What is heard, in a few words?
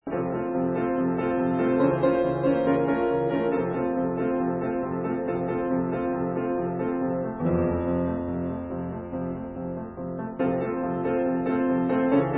Glass Piano Music